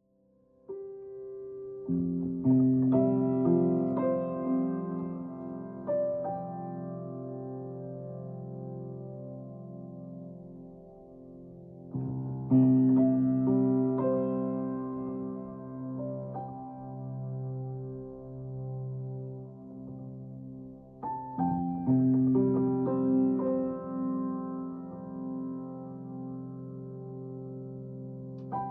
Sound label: piano diary